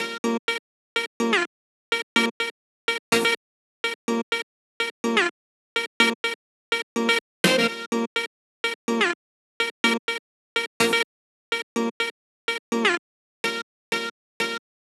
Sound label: Horn; Remix Pack